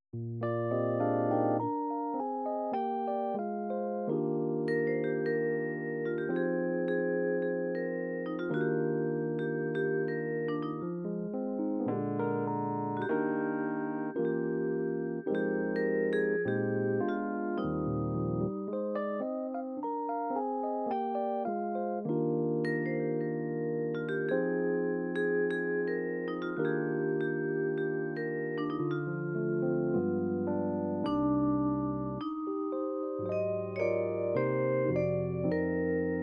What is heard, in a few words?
jazz, midi